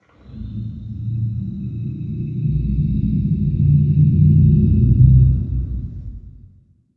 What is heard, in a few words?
alien, tomb; Zaero